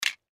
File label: Lid Set Down, Lid Set Down Cup; Set Downs; Foley; Blastwave